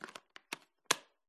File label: glitched tones household gaming video game box cartridge retro insert